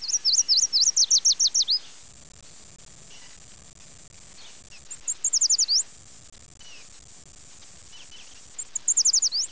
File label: yellow warbler, songs